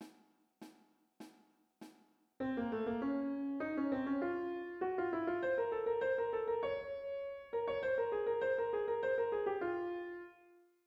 MARCHE TURQUE en doubles croches

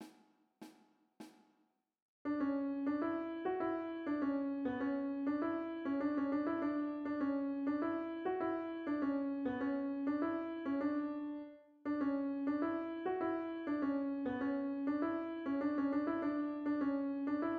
FARANDOLE en sautes

